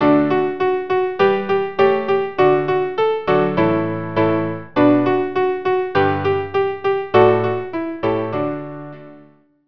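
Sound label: viola piano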